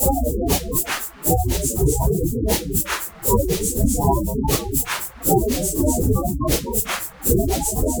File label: Spectral Blur Beat, Drum Loop; Sonic Foundry; The Electronic Music Manuscript Collection; Drum Loop Structures